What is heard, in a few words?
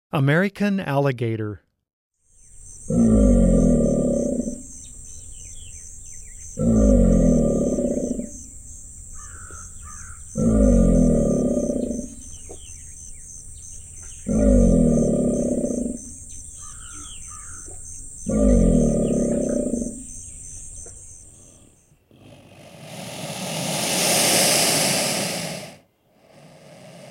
American Alligator